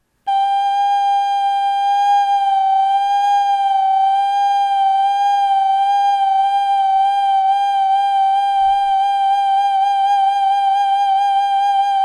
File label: ocarina